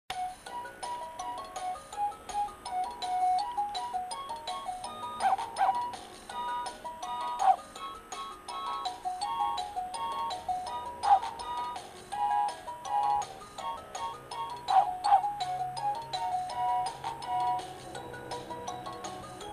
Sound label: rocker music; Bouncer music